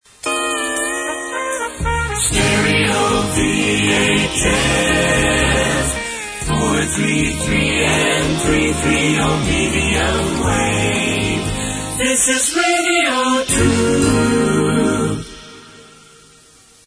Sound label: jingle